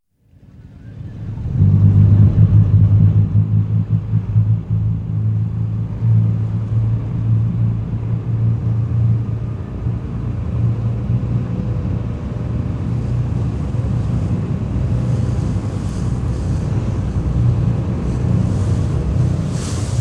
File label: Boats Lifeboats; BBC